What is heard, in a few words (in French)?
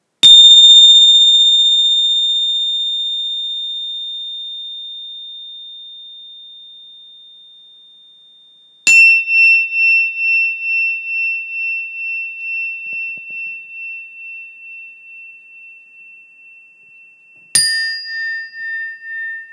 Cloche De Méditation